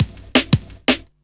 Blaze Break, 11A, Jungle Breaks; Blu Mar Ten - Jungle Jungle - 1989 to 1999 Samplepack

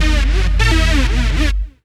Bass - 10A, Jungle; Riffs, Arps & Hits; Blu Mar Ten; Jungle - 1989 to 1999

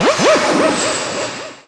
Scratch; Blu Mar Ten; Jungle Jungle - 1989 to 1999 Samplepack

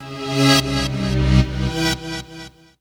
Ghost Strings; Jungle; Blu Mar Ten - Jungle Jungle - 1989 to 1999 Samplepack

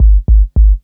Sub - 1A, Jungle; Bass; Blu Mar Ten; Jungle - 1989 to 1999

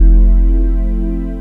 Short Pad, Jungle; Pads; Blu Mar Ten; Jungle - 1989 to 1999

